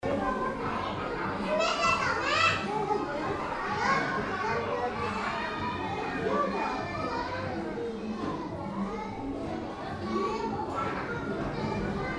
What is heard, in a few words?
nursery school